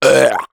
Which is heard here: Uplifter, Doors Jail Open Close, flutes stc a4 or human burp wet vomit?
human burp wet vomit